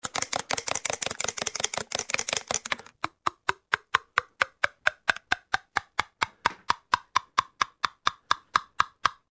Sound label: stir tap